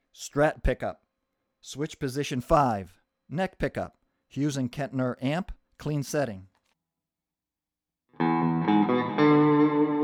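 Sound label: strat neck clean, guitars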